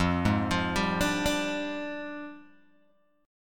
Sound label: F Minor 6th Add 9th, Guitar, Open G; 3,1,3,0,3,0; down; chord shape